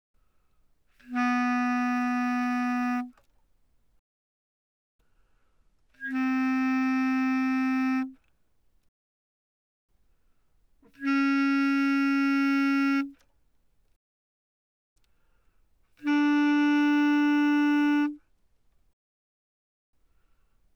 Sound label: Bass Clarinet mf C4 B4